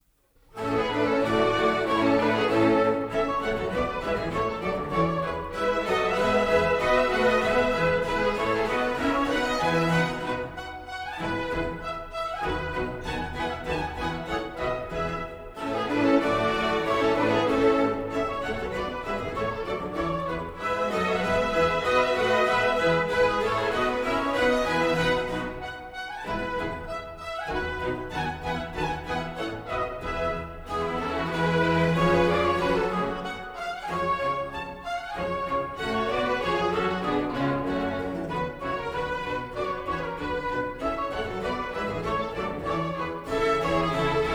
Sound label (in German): Suite B Dur Badinage